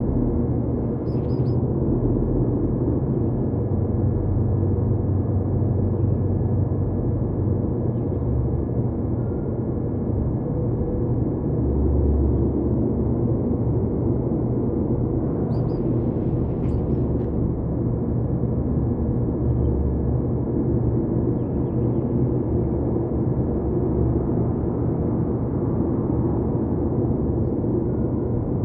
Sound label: Storage Box, ambience; Ninjago